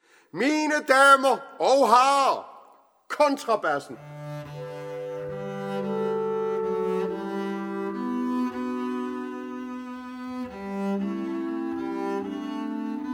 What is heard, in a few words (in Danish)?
Kontrabassen